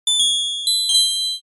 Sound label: furin